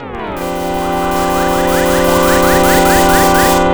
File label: enter battle; mother2